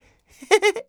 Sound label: BEAR LAUGHT